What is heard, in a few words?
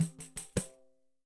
PAN ROLL, Percussion; Sampleheads; NYC Percussionworks; ST PERC VOL2